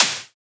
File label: Snare, Psytrance; Snares